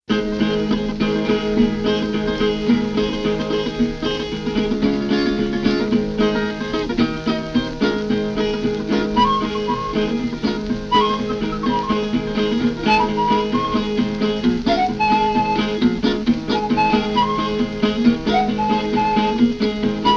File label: Flutes de Pan Quills